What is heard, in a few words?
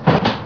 kachunk; Softdisk for Windows 33